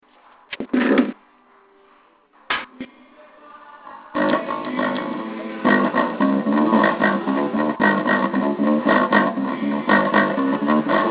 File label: bass version bass